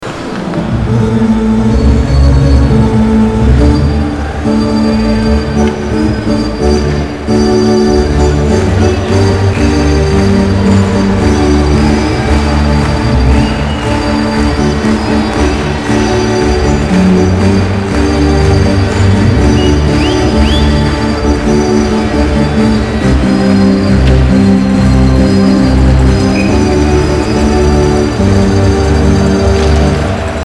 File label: ORGAN HAVA NAGILA; OLYMPIC